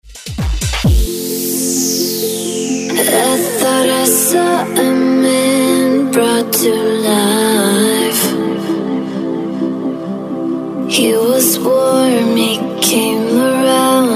Dance Redrum Intro; remixplanet

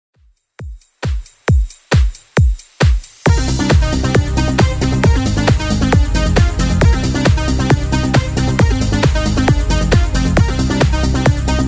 90s Redrum 135bpm

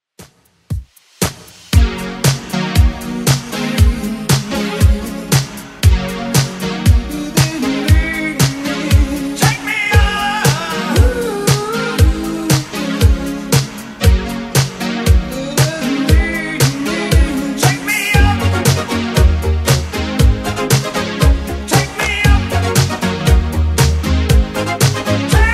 80s Redrum 117bpm

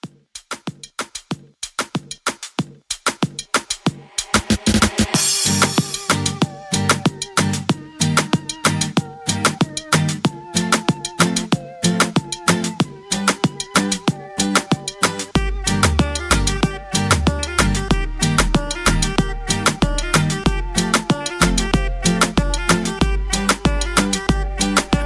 Latin Reggaeton Re Drum

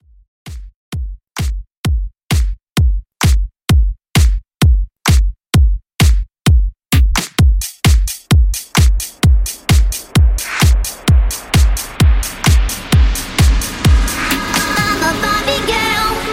Dance Extended Mix 130 Bpm Clean

